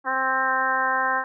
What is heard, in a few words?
trumpet